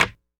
Snares

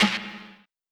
Abstract Snare

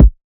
Kick

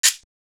Percs

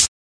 Closed Hats

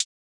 Hit, Closed Hats

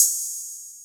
Crash

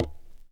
Conga; Percs

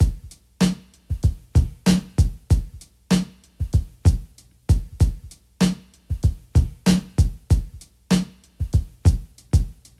Drum Loop 96 bpm

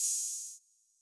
Open Hats

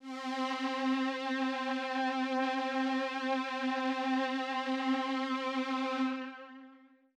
Strings

